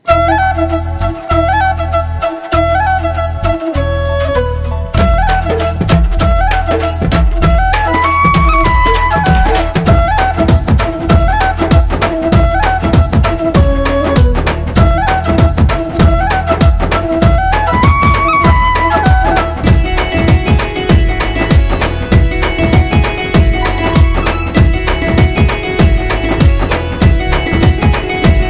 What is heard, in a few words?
folk Music, telugufolk